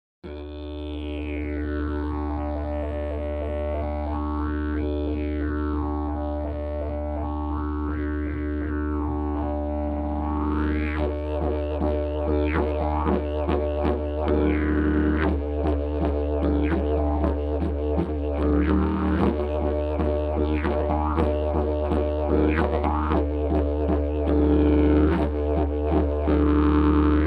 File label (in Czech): javor, didgeridoo